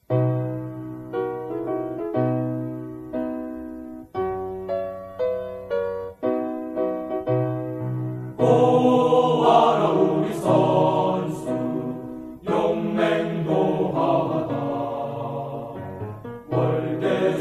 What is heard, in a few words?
ch song